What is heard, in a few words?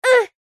human child boy 15 months short shout groan uhh; zapsplat